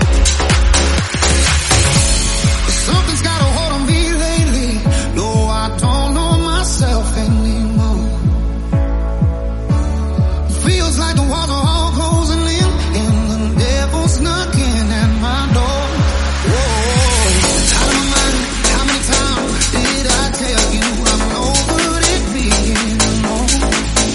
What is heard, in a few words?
124 bpm Fm